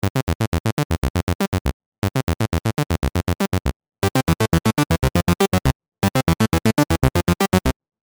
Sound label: evolver subtractor